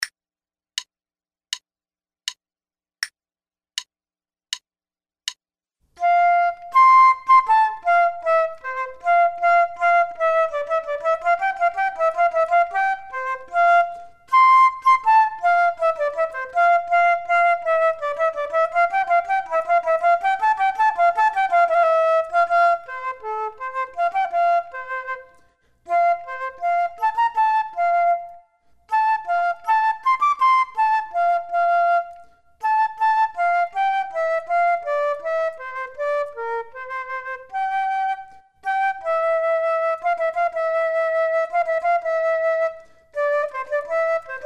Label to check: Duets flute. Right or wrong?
Right